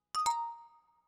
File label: Windows Error; Pearl